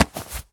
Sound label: foley object grab pickup